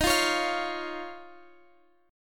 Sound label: Eb 7th Suspended 2nd Eb, Guitar Standard; strummed; chord